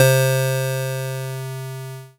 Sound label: Casio MT-600 Synth Bells C3